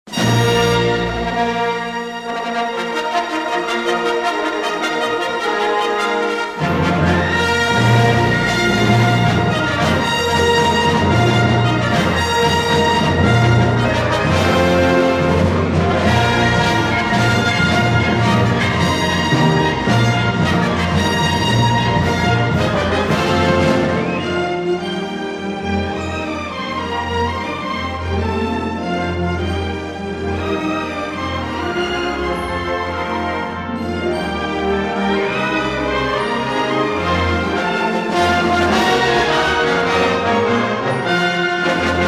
Фанф; StarWars ЗвезднВойн

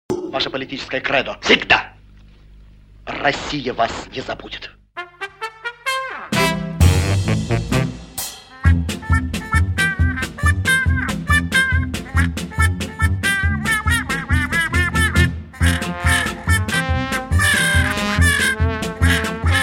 Краковяк; Музыка Из Фильмов Гайдая

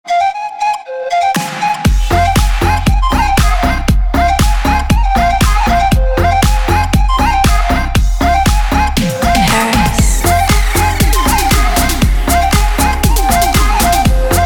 Отбивка

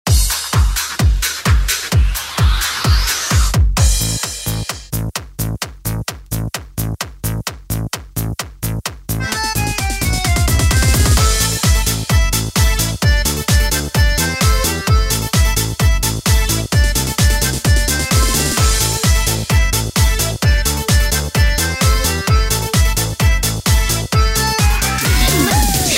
танец шишки елки

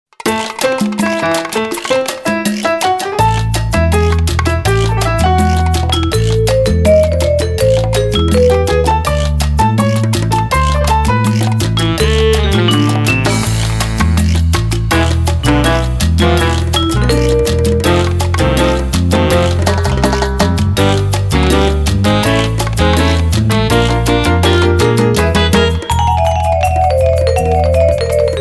Музыкальная заставка; Секс в большом городе

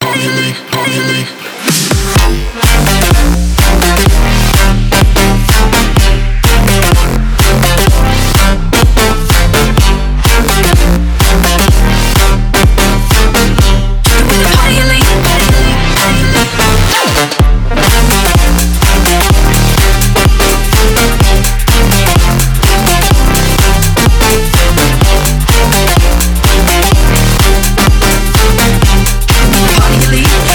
Отбивка

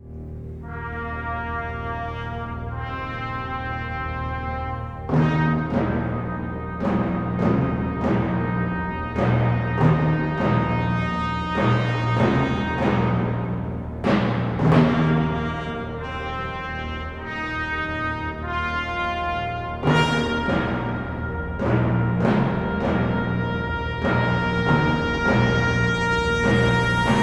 Фанф нарастающ

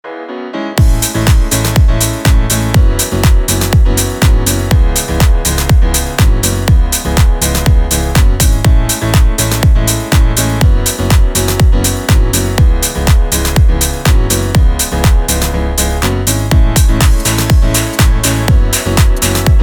Отбивка, Independent Piano

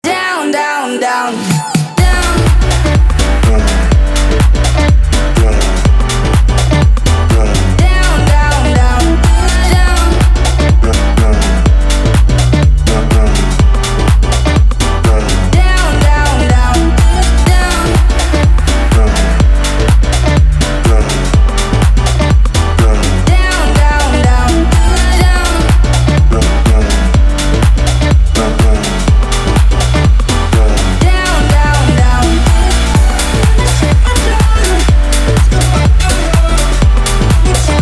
Отбивка

